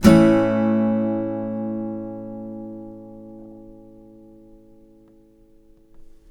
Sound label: MAJ A, Blues Guitar; ILIO; Fretworks